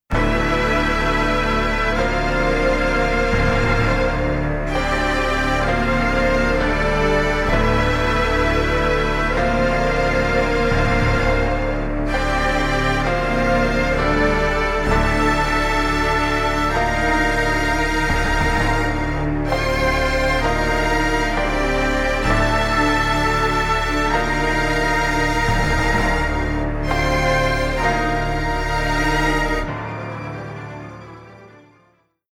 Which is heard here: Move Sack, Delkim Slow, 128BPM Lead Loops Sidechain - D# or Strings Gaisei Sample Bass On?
Strings Gaisei Sample Bass On